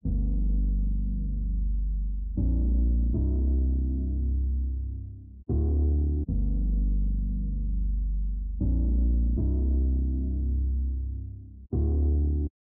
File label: 154 BPM G Min Bass; Trap; Loop Stems; Cymatics; Bullet; 2022 Melody Collection